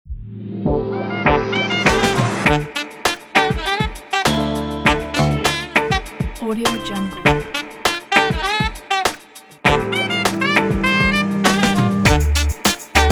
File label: hip hop; marblespace